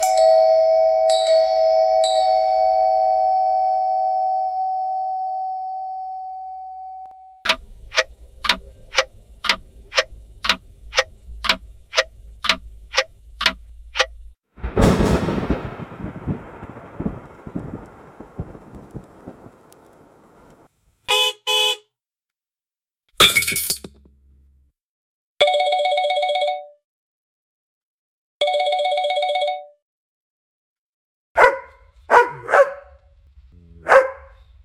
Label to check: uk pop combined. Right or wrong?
Wrong